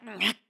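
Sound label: Lift, voice; Link; BOTW; Breath of the Wild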